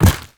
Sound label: step soft, zombie blind